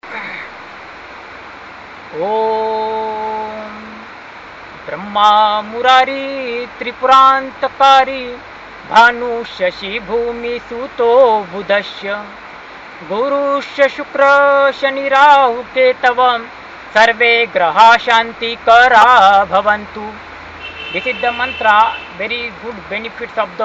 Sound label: mantra